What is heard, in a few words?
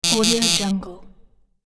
wrong answer buzzer notification